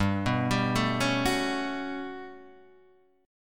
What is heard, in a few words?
G Major Sharp 11th G down Guitar Half Step Down